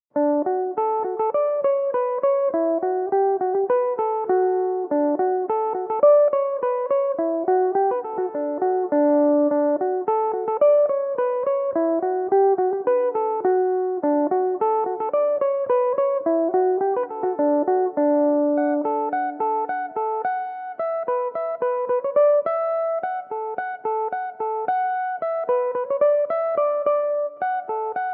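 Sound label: polka, D major, mandolin; The Newmarket Polka; Belmuse EM-190 electric mandolin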